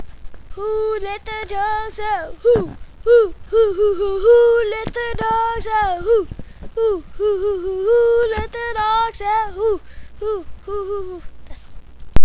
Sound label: dogs